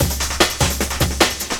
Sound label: LOOP, Drum & Bass, 150 BPM